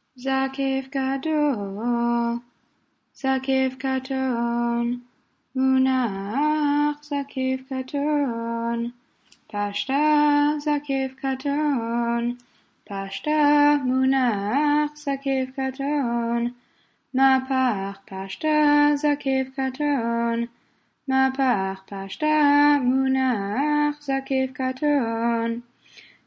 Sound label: polish lithuanian haftorah; זקף